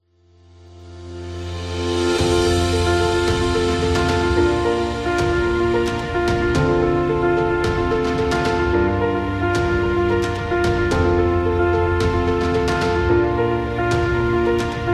Learning

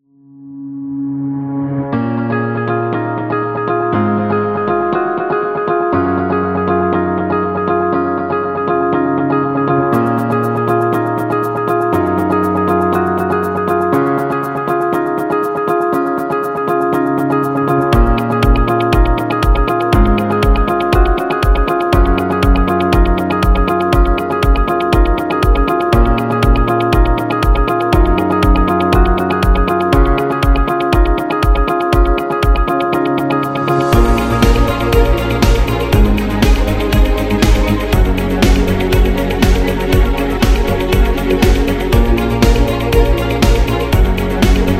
Inspirational Corporate Strings; corporate music vol 4

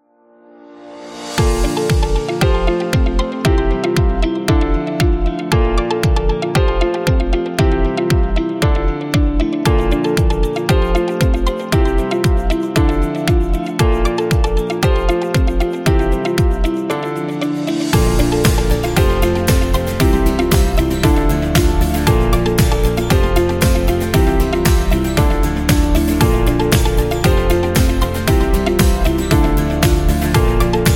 Dynamic Corporate Pop

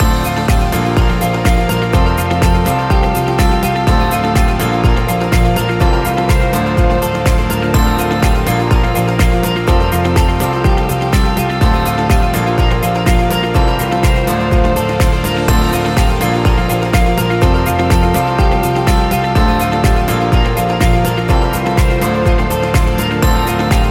Corporate Upbeat Loop

